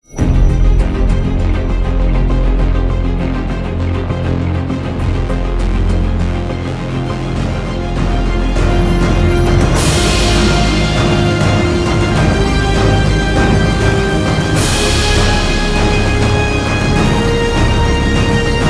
No Choir

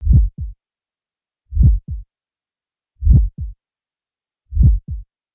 Sting Heartbeat; Brand X Music; Brand X Music Catalogue - Editor's Essentials

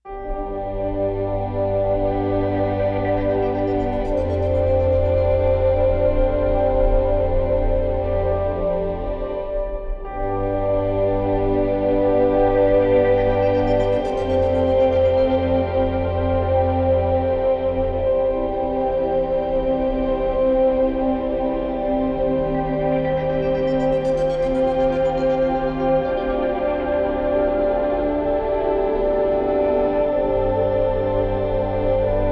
Warmth Ambient

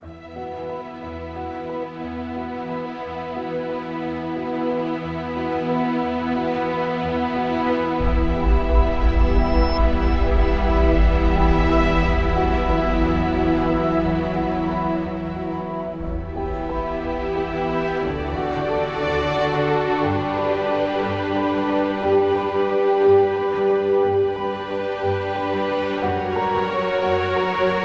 Realization Ambient

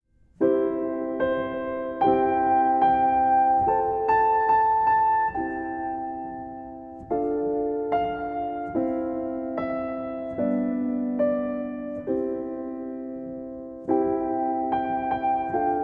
Baa Baa Black Sheep, Relaxing Piano Lullabies

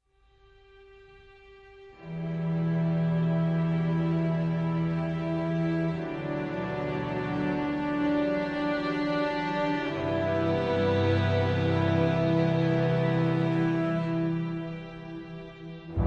Depth Ambient

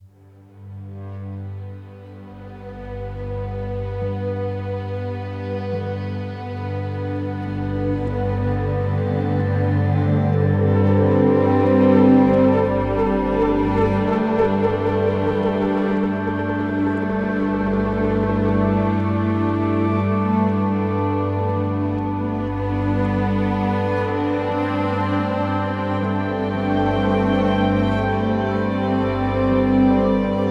Meditation Ambient

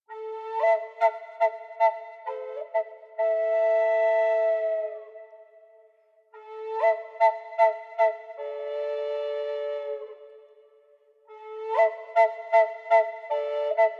The Moon & The Flute

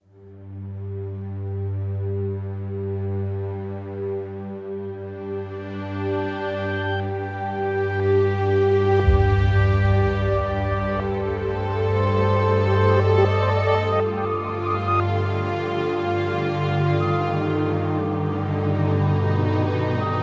Holy Ambient

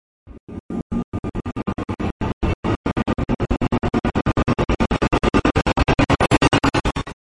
Black Burst Chopper Only; Ninja Tracks

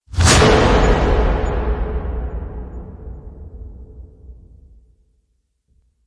Hit Swell

